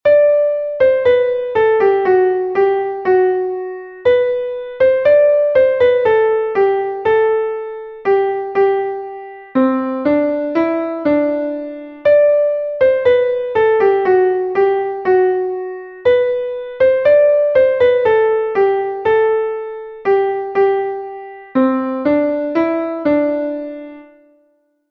viva la musica kanon, klavier

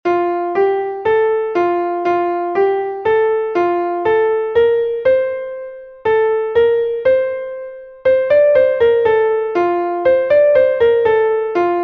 kanon klavier; bruder jakob

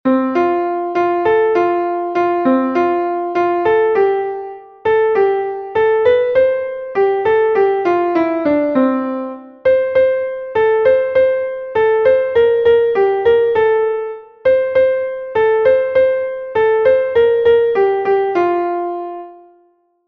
zeigt her eure fuesse, klavier

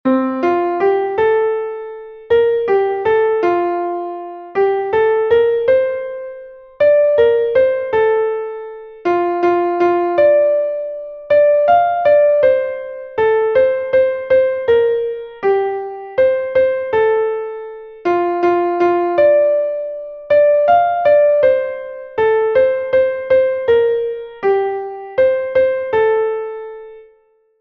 klavier; die sonne sank der abend naht

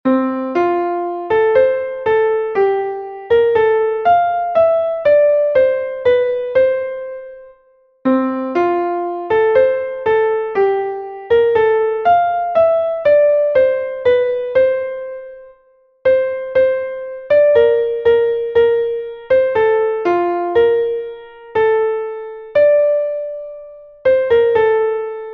klavier; was frag ich viel nach geld und gut

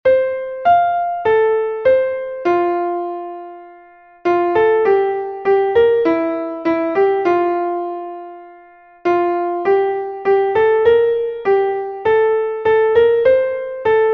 still still still klavier

